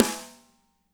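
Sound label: snare left; Spectrasonics; Burning Grooves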